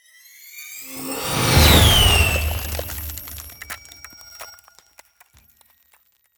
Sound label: Ice Spell Whoosh, Cold Whooshes; Arctic Aura